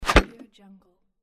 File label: wooden arrow wood impact